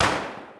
ENE MGUN; Crash Bandicoot The Wrath Of Cortex; XBOX

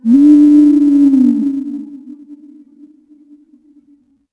Call, Dino

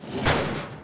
menu in

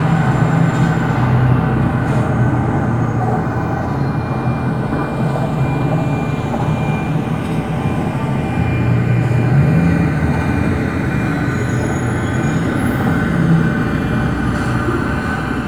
city heavy; ambient; Project Gotham Racing

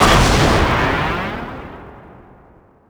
slowdown

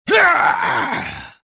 death

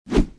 swing, melee; weapons

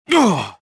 pain; jedi

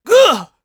attack voice; UFC Tapout; XBOX

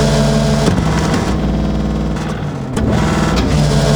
broken lift; ambience; Soldier Of Fortune II Double Helix; XBOX; kamchatka

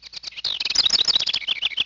seagull; ambient; Project Gotham Racing

